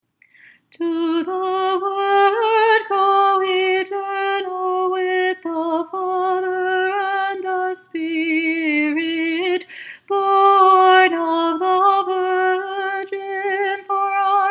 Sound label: Apolitikion Tone 5 English; ton sunanarxon logon